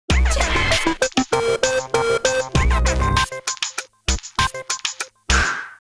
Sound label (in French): Comedie link; Jingles medley